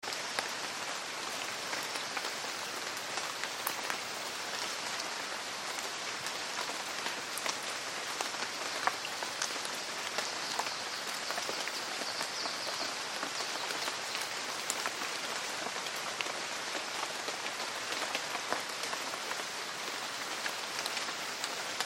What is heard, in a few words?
rain forest